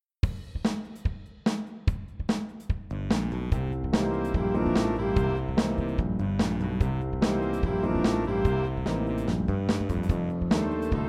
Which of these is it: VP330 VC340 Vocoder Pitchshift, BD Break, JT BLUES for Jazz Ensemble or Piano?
JT BLUES for Jazz Ensemble